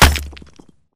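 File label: Bullet Light Ballistic Concrete impact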